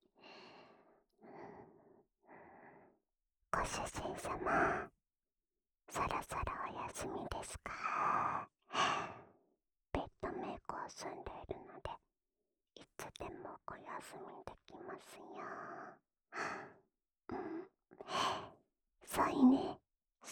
陪睡 右耳

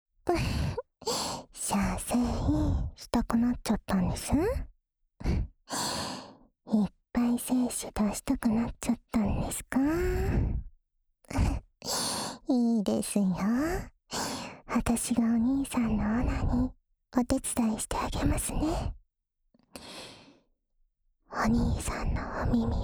浅浅的舔耳（右耳）; 辅助自慰用

